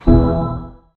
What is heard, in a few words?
player death